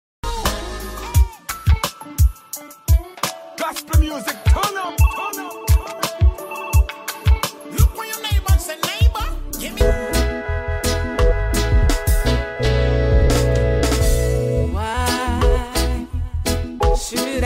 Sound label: early morning praise with inspirational reggae gospel music; crsradio